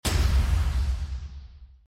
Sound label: impact hit launch